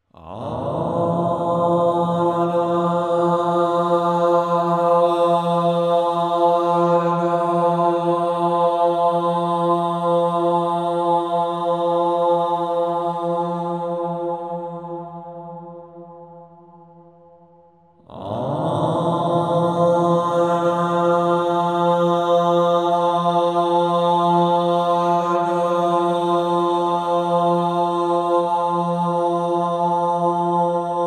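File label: monks chanting anahata sanskrit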